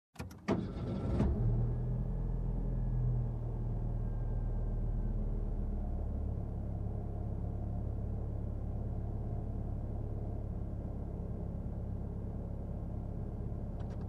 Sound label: Car engine start and driving fast